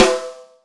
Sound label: Snares Real